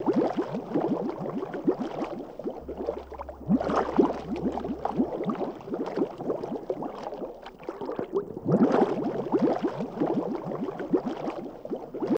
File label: Bubbling Water Loop